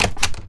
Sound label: door open